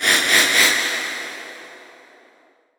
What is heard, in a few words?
door open unlock